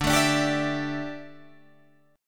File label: D Suspended 2nd Suspended 4th, Guitar, Open G